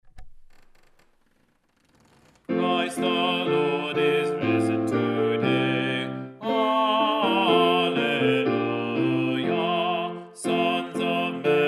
Christ the Lord is Risen Today Bass

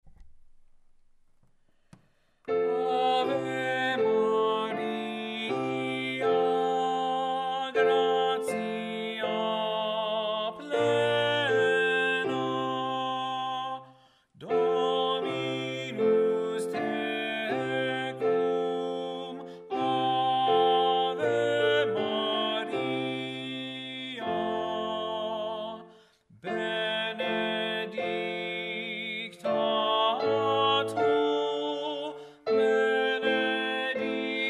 Ave Maria Tenor; Ave Maria, J. Arcadelt; Choral Resources

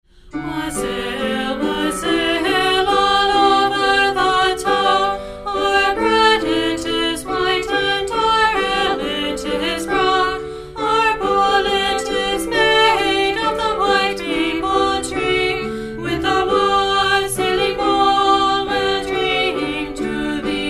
Wassail Song Girl Alto; Gloucestershire Wassail-Alto, English Traditional

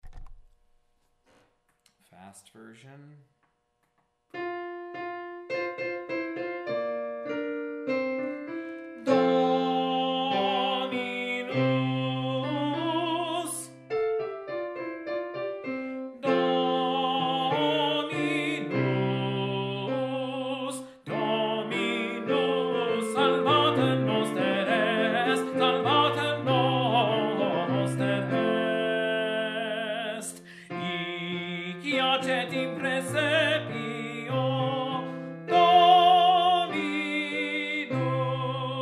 Fast Tenor; En Natus Est Emmanuel-Fast, M. Praetorius; Choral Resources

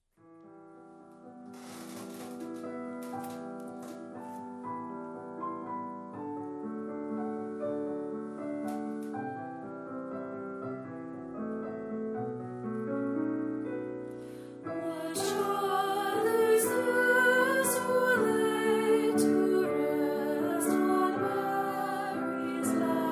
What Child; What Child is This?; lessons and carols

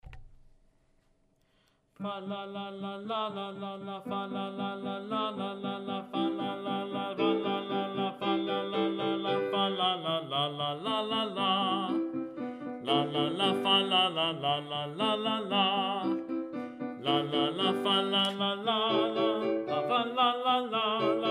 Bass; Choral Resources

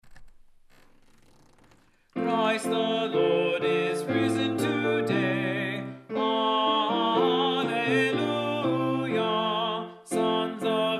Tenor; Christ the Lord is Risen Today; Choral Resources

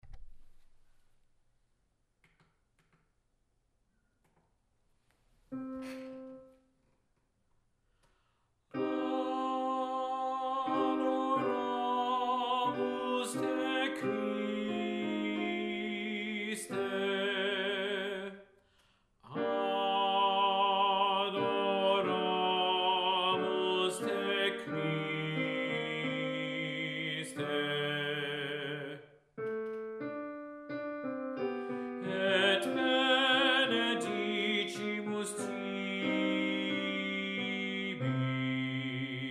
Adoramus Te Christe Bass; Adoramus Te, Christe, G. Martini; Choral Resources

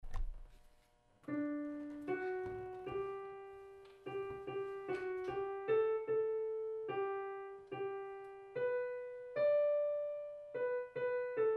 Once in Royal Tenor; Once in Royal David’s City, Arr. D. Willcocks; Choral Resources

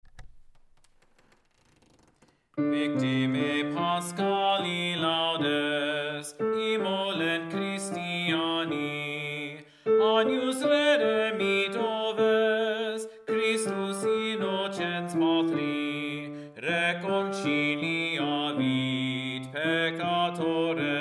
Sequence Victimae Latin; Victimae Paschali Laudes, Easter Sequence, in Latin

